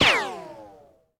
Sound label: hit shot bullet zip; Blind Drive